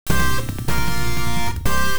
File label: Open